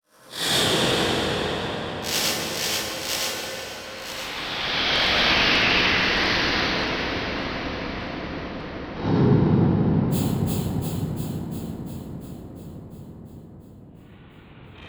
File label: Electronic Whoosh Reverse Feedback Digital